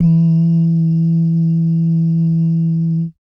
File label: bass dums; Spectrasonics Vocal Planet; Jazz FX